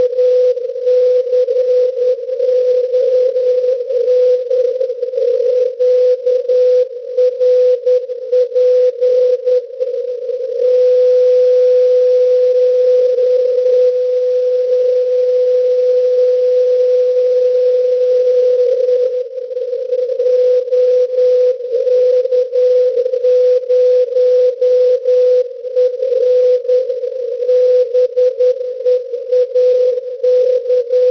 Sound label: cw